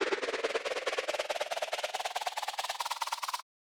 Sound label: riser